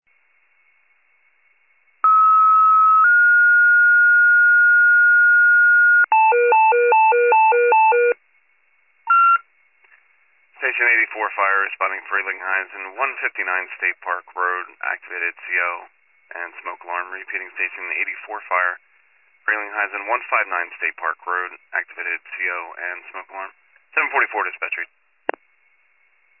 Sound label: fire, pager alert